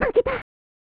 Panting; Sailorjupiter

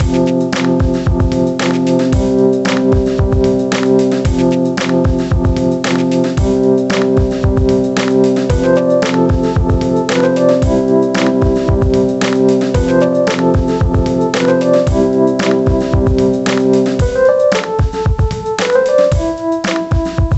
Sound Ambient; Discovery Kids Puppy Playtime; nds

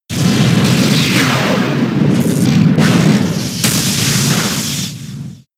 Fusion Bolt; Pokemon; gen 6; XY; ORAS

